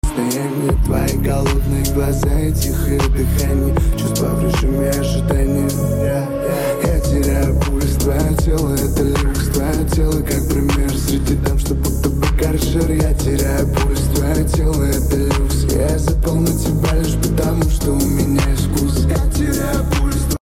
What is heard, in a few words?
Light Music